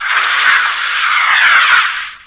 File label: spell